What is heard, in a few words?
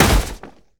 Body Fall